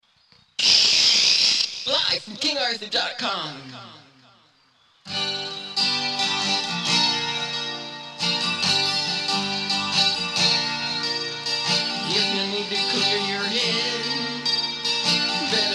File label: Sine; Observe Absurd